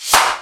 XD-5, DRUMS PERC, BOSS; Rubber Chicken Software Sample Bank

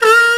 BLUESHARP B1, MUNDHARMO; Best Service; Alpine Volksmusik

